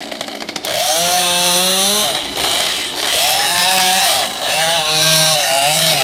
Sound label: electric chainsaw cutting wood with erratic surges; tools